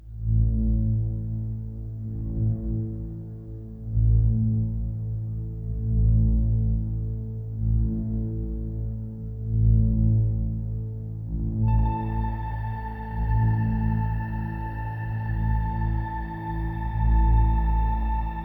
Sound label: bansuri flute chill mix